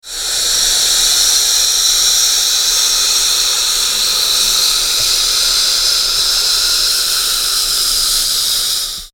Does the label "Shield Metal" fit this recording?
No